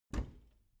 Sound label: bathroom cabinet doors open soft